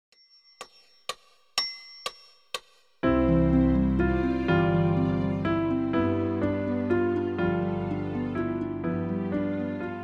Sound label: barcarolle